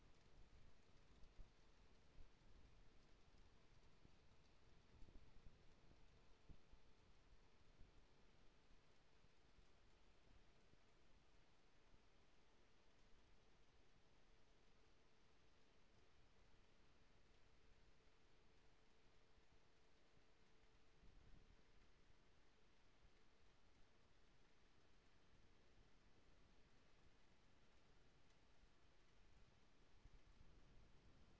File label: HYDROPHONE 16000Hz; JASCOAMARHYDROPHONE